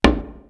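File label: DONDO 2 MED, WORLD PERCUSSION; East West; Global Sounds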